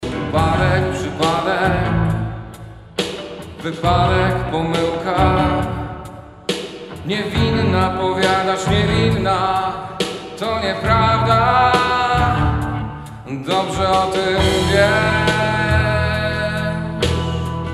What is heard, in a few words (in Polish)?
rockowa droga krzyzowa